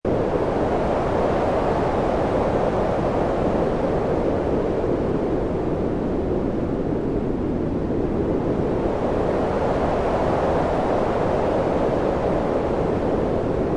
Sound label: sea wind noise